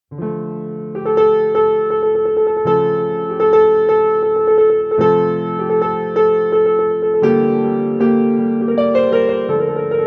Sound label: Piano